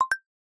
Reminder, notifications; Meizu X8

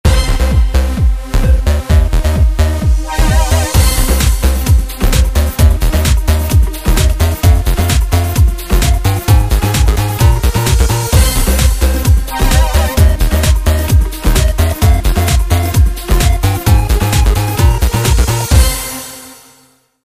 Electro Rocks

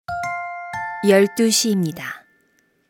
Hourly Alert; SKY; IM-U680L; System Sounds

